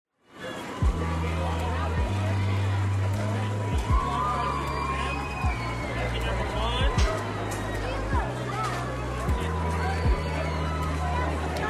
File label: city